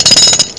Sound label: man building near; Bolo